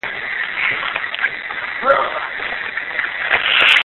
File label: noise; EVP